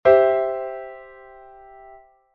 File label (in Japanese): C△コード第二転回形